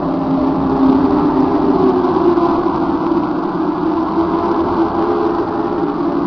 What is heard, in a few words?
vortex still